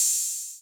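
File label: Open Hi-Hat 808 Color B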